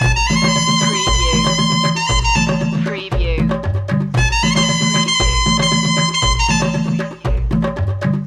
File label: ethnic world loop